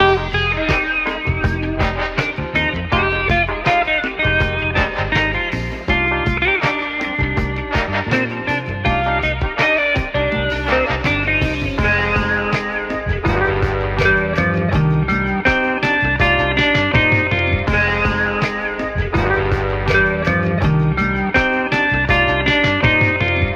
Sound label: full guitar and horns and small drums per se; Drum loops